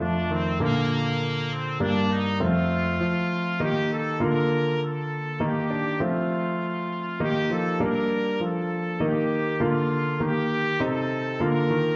first noel, trumpet

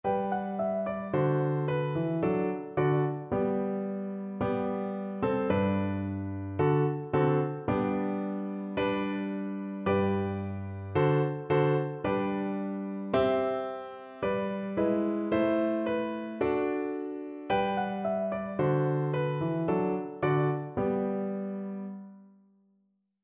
diddle diddle FL kar, Flute

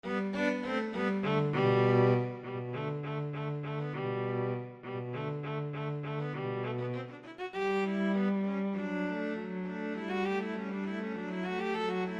viola cello duet; mozart k487 no1

